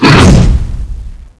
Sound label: attack; zombie giant